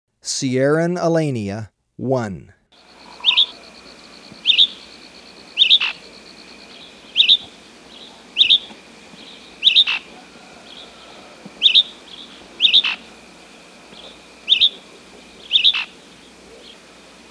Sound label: Sierran Elaenia, Birds of Ecuador; S. America